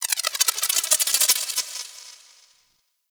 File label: Sculpture Spin; Ambience; Mountain Pass; Oro Sculpture; Warframe; The War Within